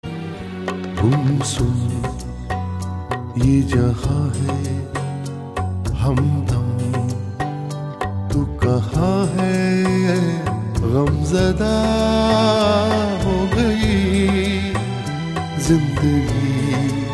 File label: Gazal Tone